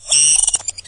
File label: tick pain; doom3